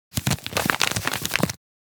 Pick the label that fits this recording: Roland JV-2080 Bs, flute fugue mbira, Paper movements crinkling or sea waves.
Paper movements crinkling